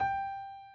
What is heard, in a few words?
key; keySounds